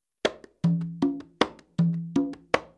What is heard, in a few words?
tumbao, congas